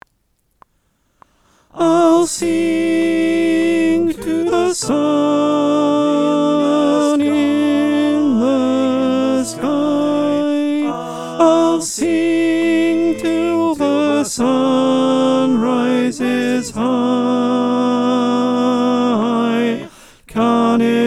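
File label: alto, choir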